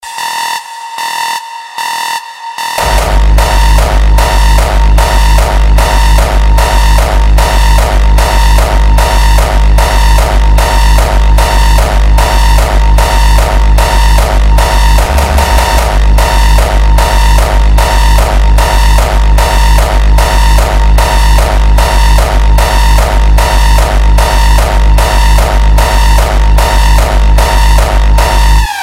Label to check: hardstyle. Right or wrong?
Right